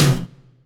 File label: acoustic tom sound c sharp key